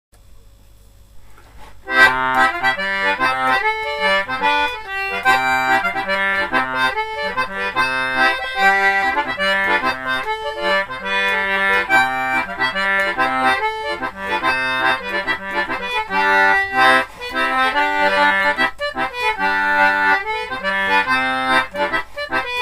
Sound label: harry coxs schottisch, concertina; faking examples